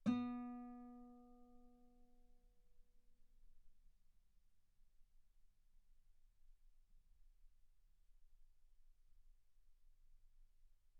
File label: Guitar ff B3; sul B